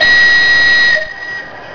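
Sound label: whistle, vehicle; dr1; svistok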